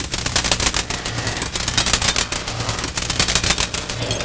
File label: FX Loop, Drums & Drones